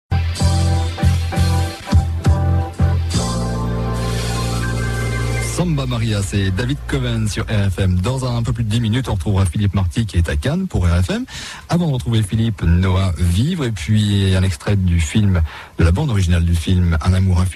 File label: Samba; RFM; Pige